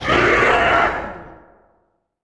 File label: cheer, orc